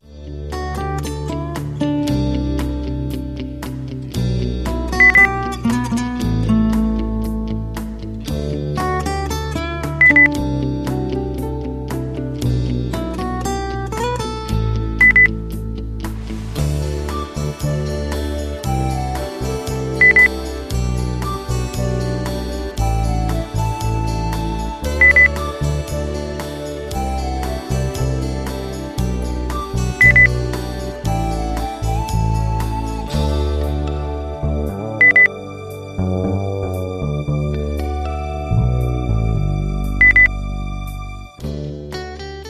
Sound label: pop